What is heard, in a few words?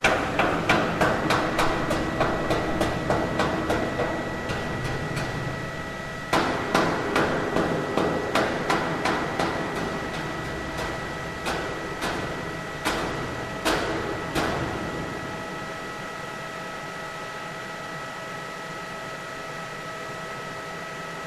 Industrial Amb Factory